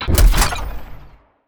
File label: ui operator complete reveal; user interface; gmod; mw